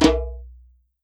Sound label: MIXED DRUMS